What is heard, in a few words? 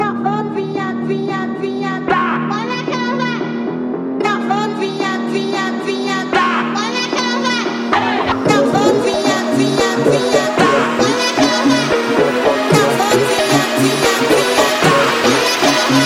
Phonk Music; فانک phonk